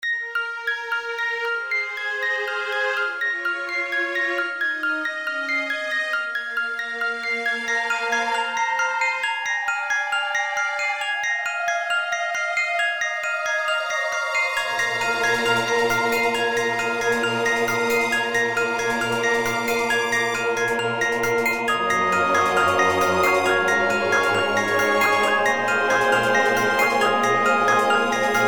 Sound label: Ambience